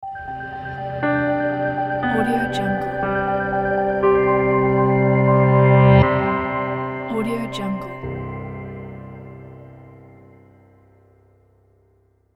piano logo; edyermusic

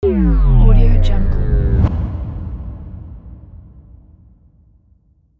game over sound

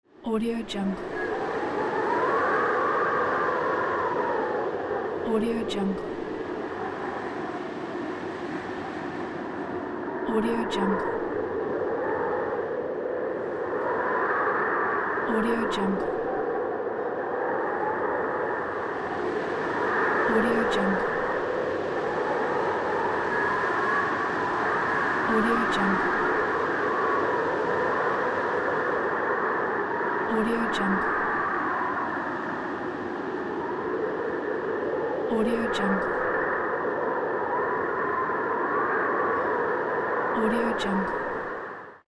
wind sound

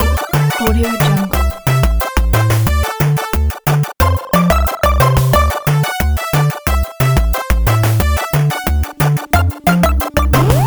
8 bit retro background

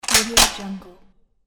gun reload

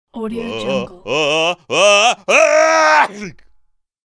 cartoon male giant sneeze

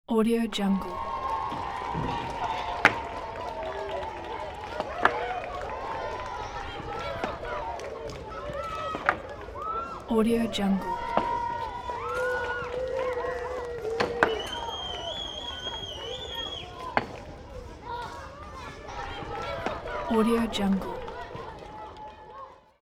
children playing; sound-ideas

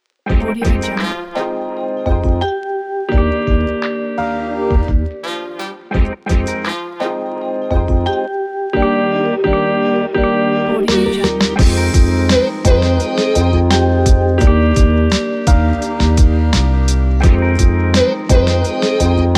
jazzy hiphop

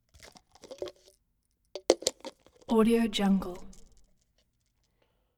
squirt bottle

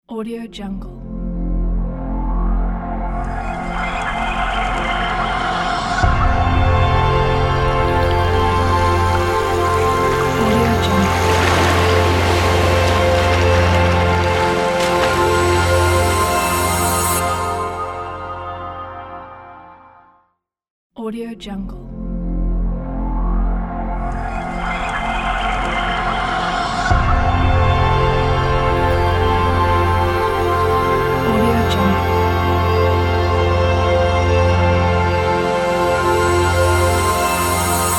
elements water ident

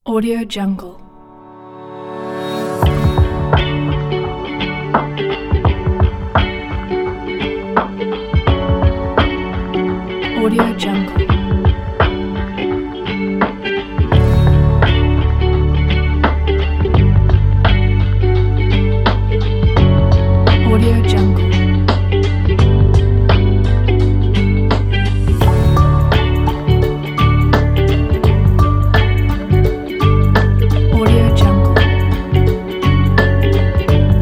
lounge background

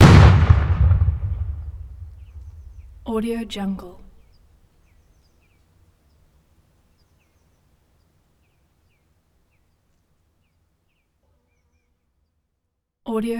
explosives open exterior; soundmorph

